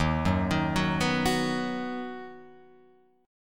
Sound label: D sharp Minor Sharp 5th D sharp down Guitar Open F; arpeggiated; JGuitar